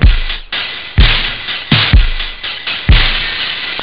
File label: acids; Amiga Soundtracker Sample Packs